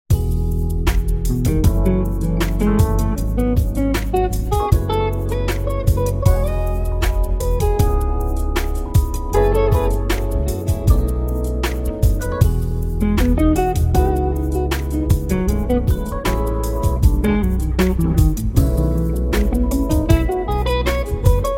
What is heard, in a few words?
Amazing Jazz Chillout